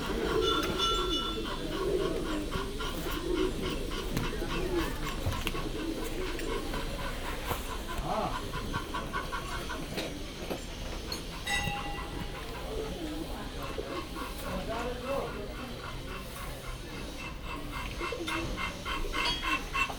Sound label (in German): An der Stupa, Boudha, Nepal; Kathmandu